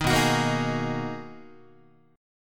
B Major 13th, down, Guitar, BM13 chord; strummed; JGuitar

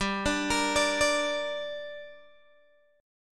G Minor G down Guitar Open G, chord

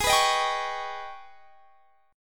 Ab Minor Major 11th down; strummed; AbmM11

